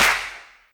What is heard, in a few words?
hand clap sample b key; single hits one shots